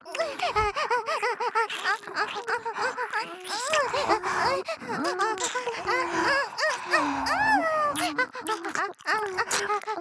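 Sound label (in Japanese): 激しい演技喘ぎ＋両耳舐め; 美冬; 小春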